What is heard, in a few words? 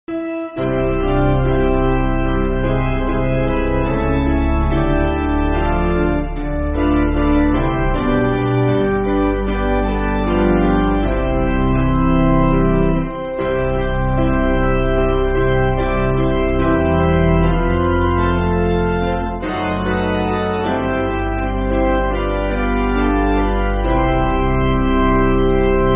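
Piano, Backing Files for Churches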